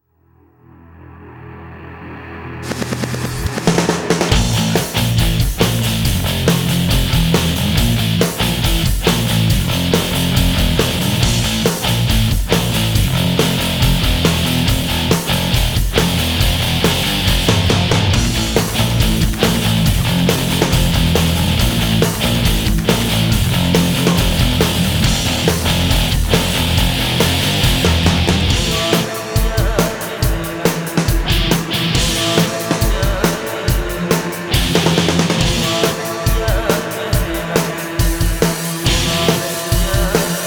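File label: Ship Combat, game music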